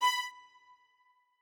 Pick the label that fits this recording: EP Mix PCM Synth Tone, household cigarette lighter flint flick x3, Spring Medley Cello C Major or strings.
strings